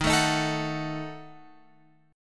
D sharp Diminished D sharp

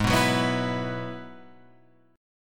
Ab Minor 7th Sharp 5th, Guitar, Open F; strummed; 8,6,6,6,7,6

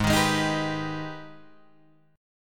Ab Minor Major 7th, Guitar Drop D chord; AbmM7; strummed

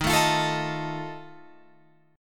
Eb Suspended 2nd Flat 5th, Guitar, Open F; strummed; chord; 15,16,17,16,18,16; JGuitar

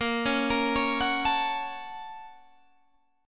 Bb Minor Major 7th Sharp 5th, Guitar, Open G; 20,18,20,18,19,19

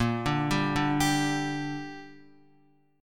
Bb 6th Bb, Guitar; Bb6 arpeggiated

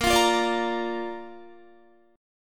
A sharp Major, Guitar; strummed; tuning 4F,4A,5C,5G,5C,6E

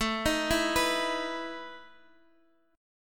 E Major 7th Suspended 4th, A

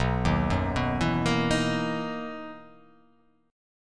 B Major 11th B down

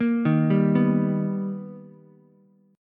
B Augmented Major 7th B Ukulele, chord; B+M7; arpeggiated; JGuitar

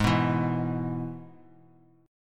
Ab Minor Sharp 5th Ab; strummed; x,11,9,9

